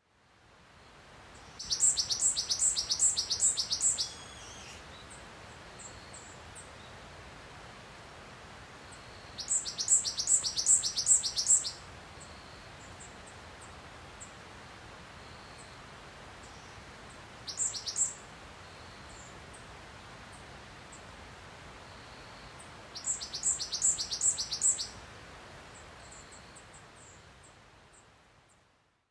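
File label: Owston's varied tit, ishigaki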